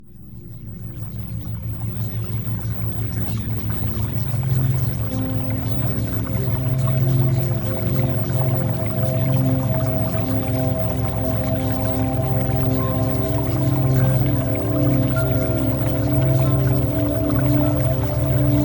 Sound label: Music Theta Waves; CLEAR SKIN